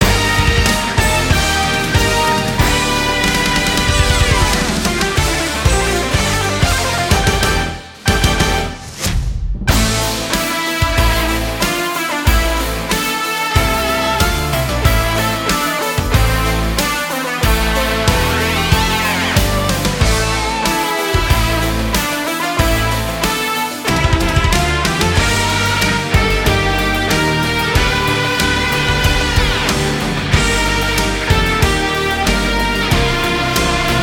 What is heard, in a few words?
Pigcity Loop; angry birds transformers 2014 ios